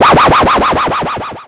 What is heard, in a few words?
Title Transition Delay Short